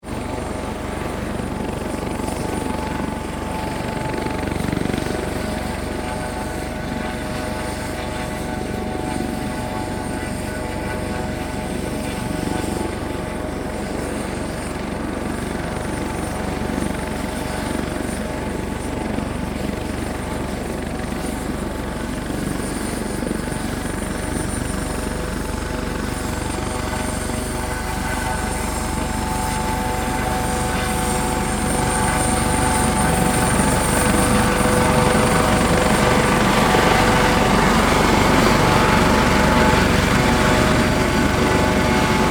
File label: Helicopter Taxi By, Aircraft, Helicopter, Taxi, By, Hh-65, Dolphin; Transportation